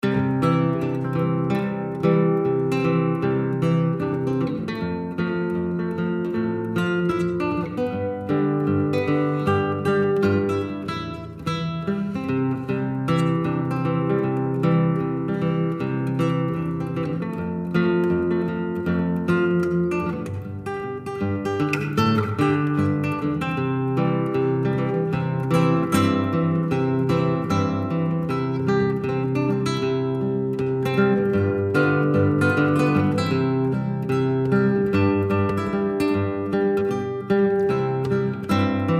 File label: Jingle Bells